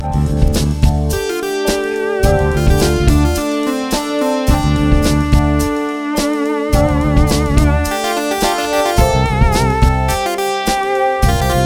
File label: Lead; DeepMind 12